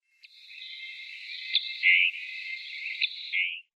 Scarlet Tanager calls

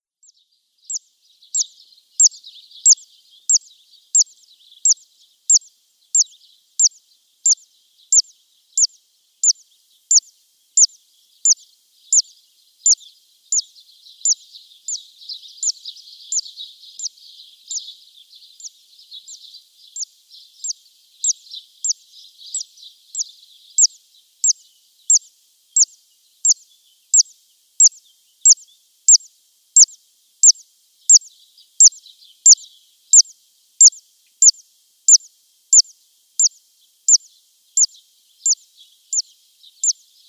Zitting Cisticola song

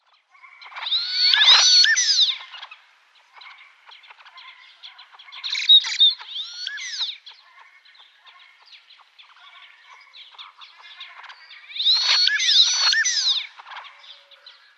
Cape Teal male

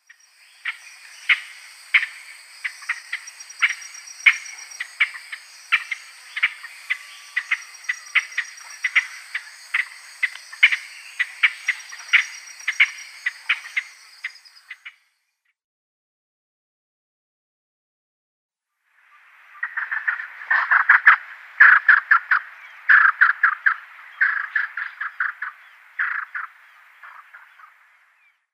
Double-spurred Francolin calls

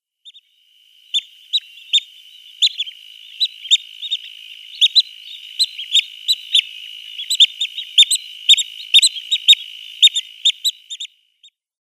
Black-winged Stilt juvenile call

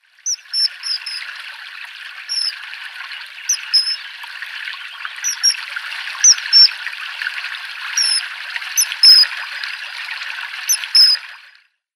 Common Kingfisher male display

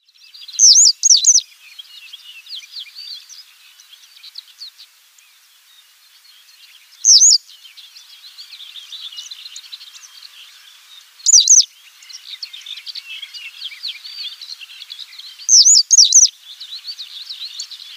Citrine Wagtail song

